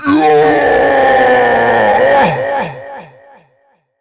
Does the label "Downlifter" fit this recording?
No